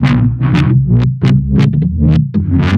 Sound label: 173 Bass, Drum n Bass; KJ Sawka; Drum n Bass & Beyond